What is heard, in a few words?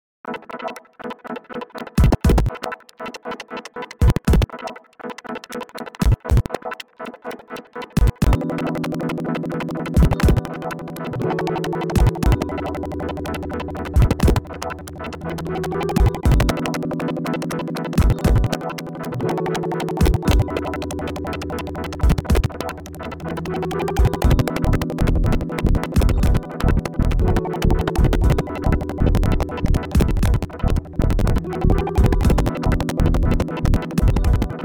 Pitch Shifter; Tracktion